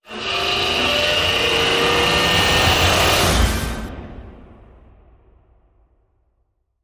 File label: Rise Root Canal